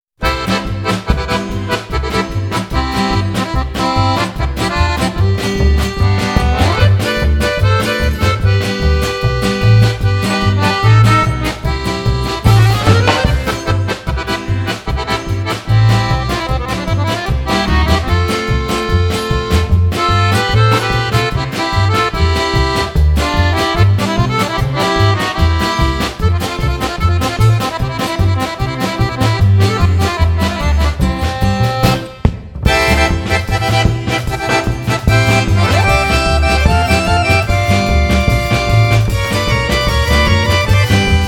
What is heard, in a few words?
Retro Polka